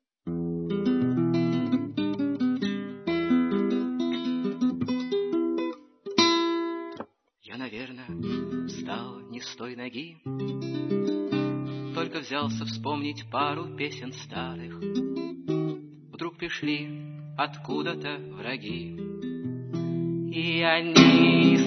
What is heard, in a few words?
guitar